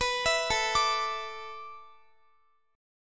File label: A Suspended 2nd Suspended 4th, down, Guitar; Asus2sus4; arpeggiated; 4E,4A,5D,5G,5C,6F; chordshape